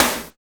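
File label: hurt me snare; Drums In Your FACE!; NEWJACK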